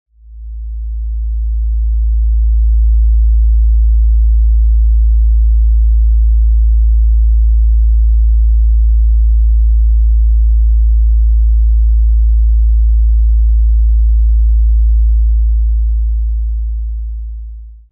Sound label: 55 Hz A1 Pure Sine Wave